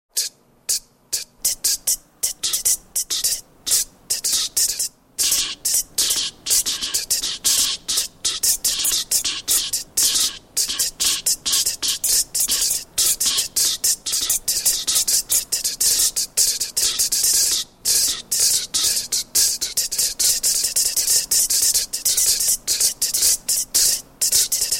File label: thread noise